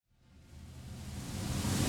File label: musique gameplay fx RISER; gladiabots